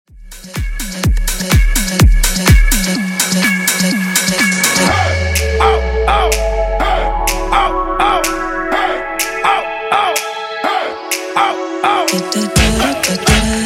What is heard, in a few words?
Bootleg Hype, 125 Bpm